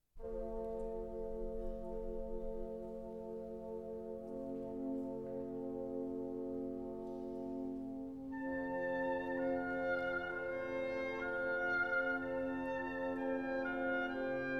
Violin Concerto in D Major: Adagio

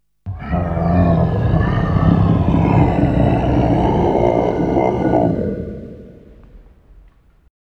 Monster, Halloween; Halloween Sound Effects

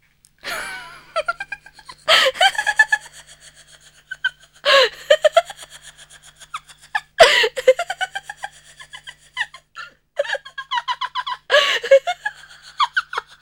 Squeaky Laugh; Halloween Sound Effects

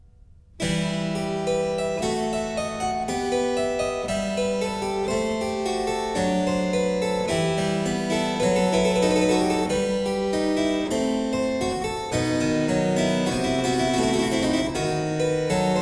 Prelude & Fuge in F minor, Keyboard; No.12; BWV 857; J.S. Bach; The Well-Tempered Clavier Book I

